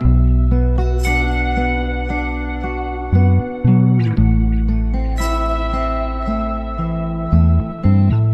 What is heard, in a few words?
guitar, Sad